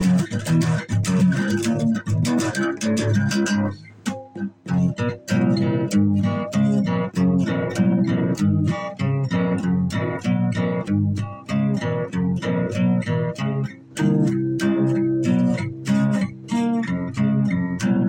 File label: guitar